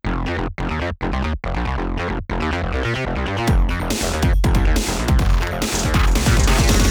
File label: Intro, 140 bpm; fashion police squad beta; Hackerman